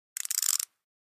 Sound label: Flash Gear Slow Multi, flash gear